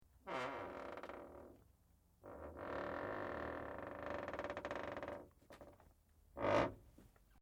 METAL CREAK LIGHT; Materials

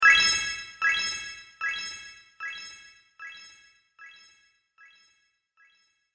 Retro Video Game Spell With Delay; Magic Sound Effects

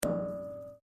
RUBBER ON METAL; Sound Effects:Materials

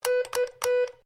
MORSE CODE K; Morse code aplphabete; Morse Code (Sound Effects:Electronics)

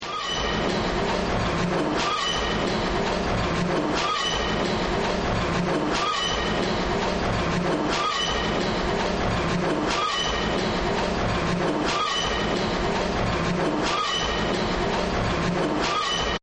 Factory

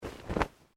Clothing shirt cotton flap; Movemement sounds for various articles of clothing; Dressing; Human

